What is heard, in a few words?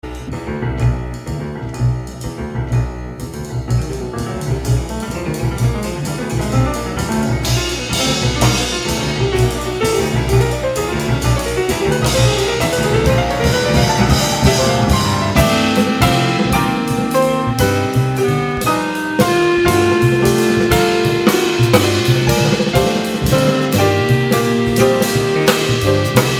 Waltz Variations